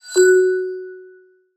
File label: scan success